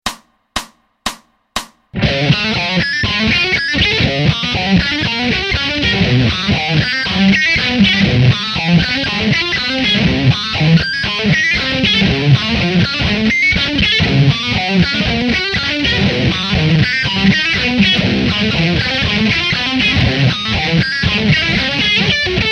Arpeggio Etude Fast

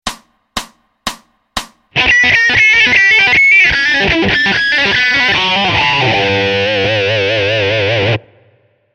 Open String Pull Off Lick fast

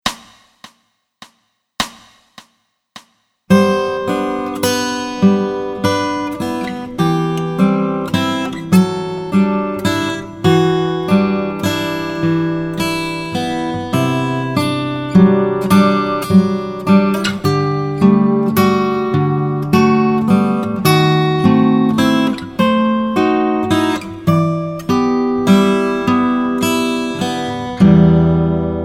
Acoustic SLOW

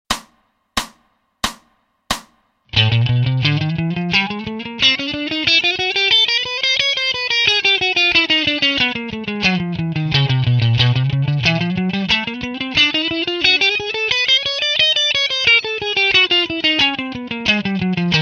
Pick & Fret Hand Sync (16ths SLOW

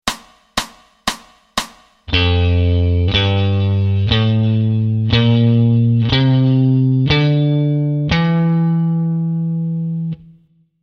C Mixolydian 6th String

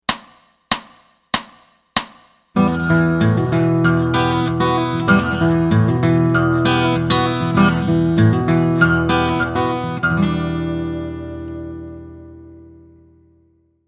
Open Chords With Fingers Em; This E minor chord example; this figure is written to use a different set of plucking-hand fingers

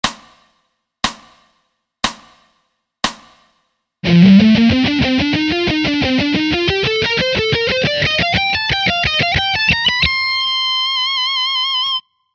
Alt Pick Slow; Any time you use one pick stroke to articulate two or more notes on neighboring strings

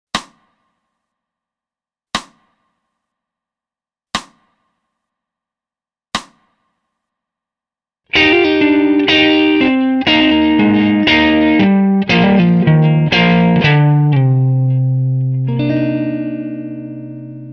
C MIN SCALE Rip slow

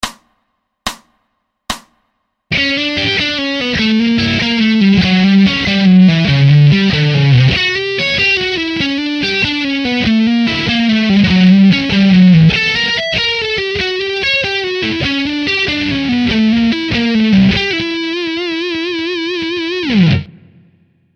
E Dorian Spiral Lick slow

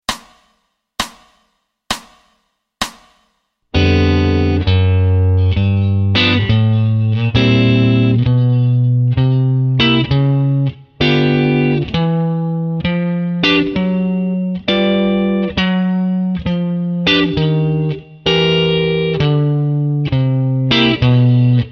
Walk Bass With Inv (SLOW)

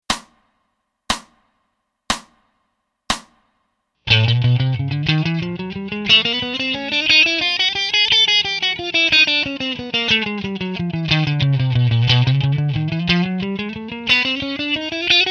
Chromatic Scale slow

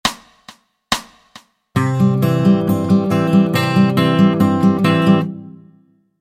Travis Picking; alternating between our new “C” and “G” notes at a more rapid rate

